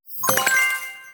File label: reward